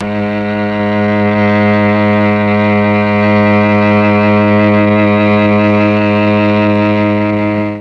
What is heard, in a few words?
G#2 Mellotron cello; Mike Pinder - Mellotron Archive CD